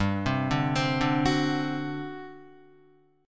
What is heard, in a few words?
G Major 7th Suspended 4th, G, down, Guitar; GM7sus4 arpeggiated; JGuitar